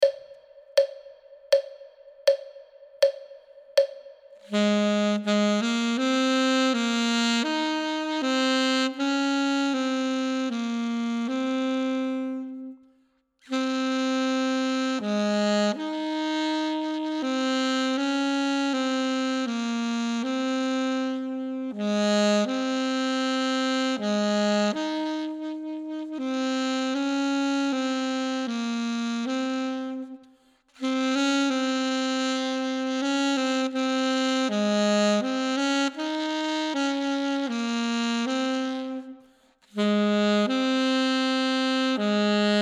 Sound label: duet part 2; Ten Easy Duets